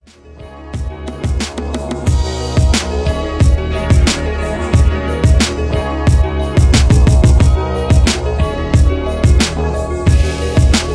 ROAD TO GLORY 90BPM

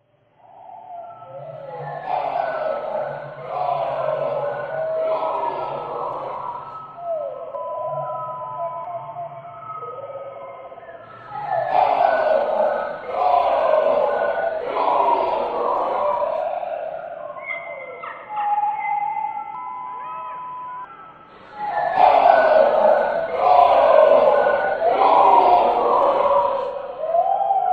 dino ringtone

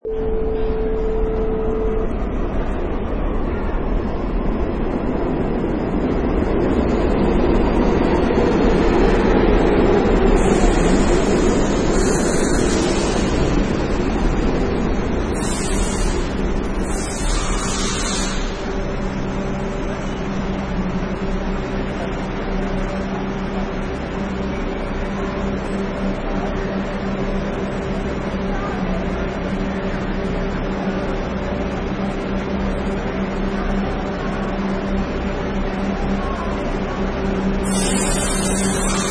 Subway Train Arrives and Departs

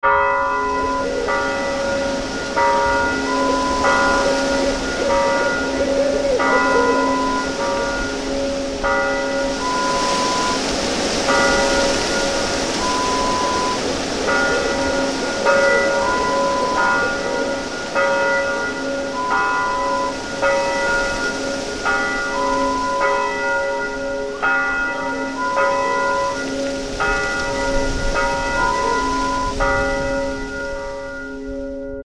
Graveyard, Horror Sound Effect

